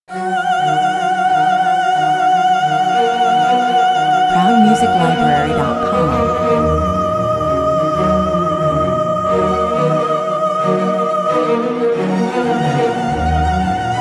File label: commercial